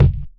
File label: subby kick single shot a key, kick sample tuned to the A note; kick drum samples